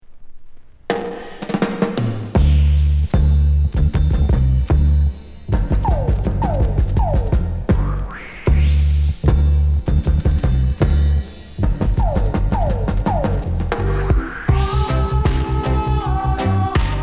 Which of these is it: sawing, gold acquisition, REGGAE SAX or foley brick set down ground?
REGGAE SAX